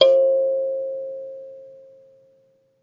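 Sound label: KALIMBA D2; ILIO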